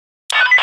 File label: radio draw, weapons; cstrike